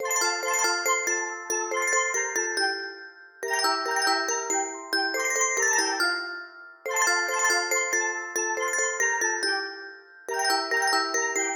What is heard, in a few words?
dreidel dreidel; Google; Google Sounds; Seasonal Celebrations